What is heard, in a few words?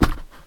sand